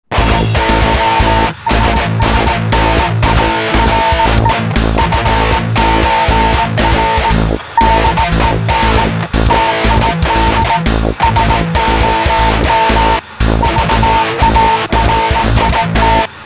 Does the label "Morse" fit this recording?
Yes